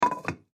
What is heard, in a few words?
Ceramic Lid Close, Ceramic Lid Close On Urn; Rock Sound Effects; Materials; Foley